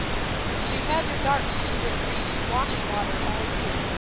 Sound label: noisy pink 5dB